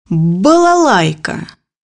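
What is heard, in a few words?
balalaika; bukvar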